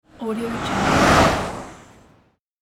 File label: car passes by at fast speed